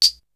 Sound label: Perc; ZERO G - Datafile DISC 3